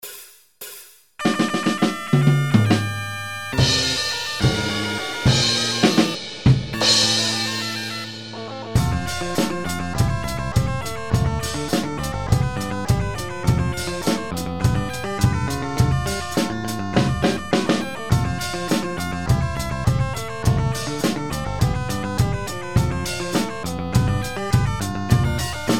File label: drum scratch